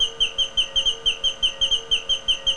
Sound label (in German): zwitscher